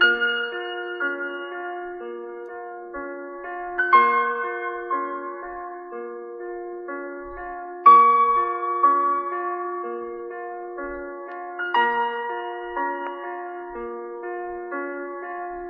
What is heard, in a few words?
piano, sad loop; loops